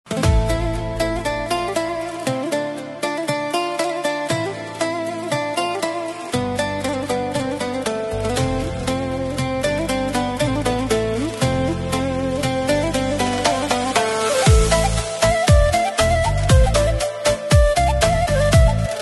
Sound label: Debka Instrumental